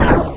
laser cannon fire; weapon

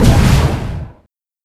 rocket fire; weapon; missile

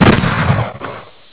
med plasma fire, cannon; weapon

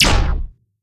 small laser fire; weapon

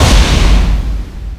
med rocket fire; weapon; missile

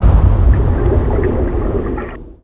ex underwater; explosion; wet

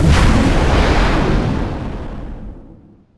large missile fire; weapon

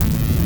laser burn; weapon

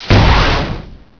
heavy aa fire, missile; weapon

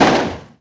med aa hit, weapon missile

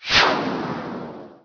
rapid rocket fire; weapon; missile

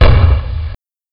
generic cannon; weapon

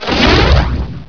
med aa fire, weapon missile

